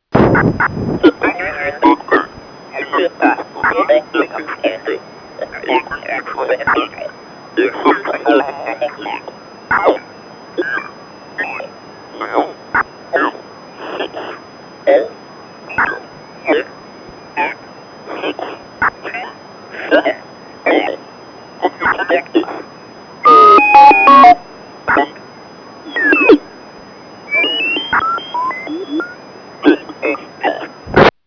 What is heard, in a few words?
scramble